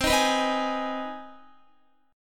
C Minor Major 7th Sharp 5th C Guitar 4F,4A,5C,5G,5C,6E; CmM7#5 strummed; JGuitar